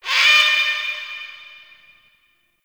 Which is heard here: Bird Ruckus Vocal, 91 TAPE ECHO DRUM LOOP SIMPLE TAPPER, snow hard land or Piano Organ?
Bird Ruckus Vocal